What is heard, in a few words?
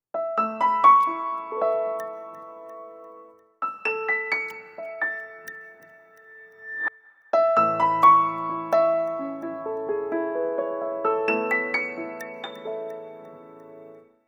Sunrise View Piano, ringtones; Vivo X80-Pro